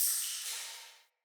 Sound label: Cave Ocean Drip